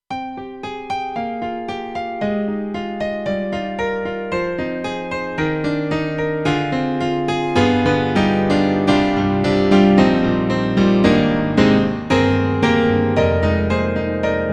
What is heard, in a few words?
KORG X3R; ExpressoPF